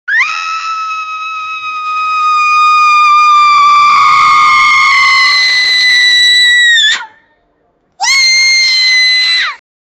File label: anguished